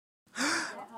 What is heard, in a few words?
Man Gasping